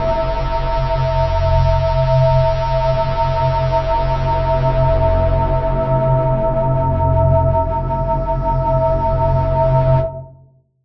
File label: drone hellish F, drone pad texture